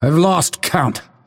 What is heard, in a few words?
lasthit pain; dota; phantom assassin